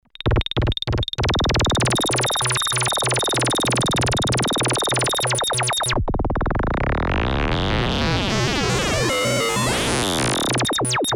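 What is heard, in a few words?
bouncy, Compact analog monophonic synthesizer; MFB nanozwerg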